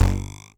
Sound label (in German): Feile